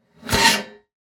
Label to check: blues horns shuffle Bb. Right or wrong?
Wrong